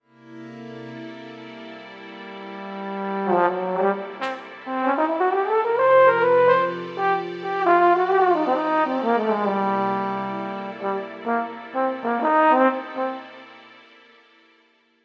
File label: tenor trombone; vsl